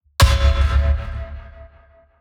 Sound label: Impacts DESIGNED; SoundBits; Just Impacts